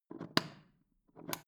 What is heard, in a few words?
foot switch turn on off slow